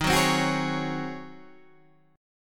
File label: Db Major 7th Suspended 2nd Suspended 4th Eb